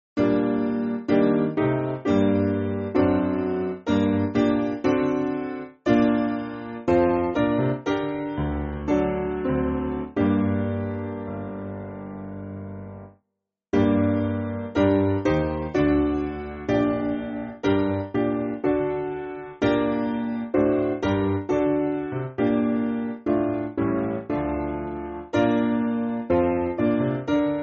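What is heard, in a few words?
Piano, Backing Files for Churches; St. John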